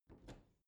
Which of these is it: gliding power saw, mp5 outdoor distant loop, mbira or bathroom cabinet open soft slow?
bathroom cabinet open soft slow